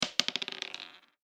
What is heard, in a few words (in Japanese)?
中くらい シングル ダイス